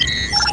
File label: Beep